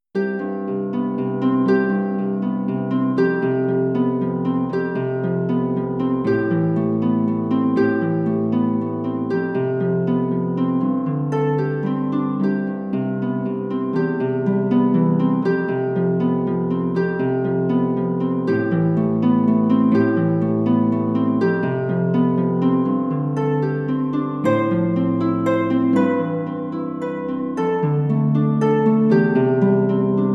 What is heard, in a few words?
Harp; VIVO S10